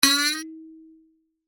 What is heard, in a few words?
Spring Boing; Texture; Twangs; Objects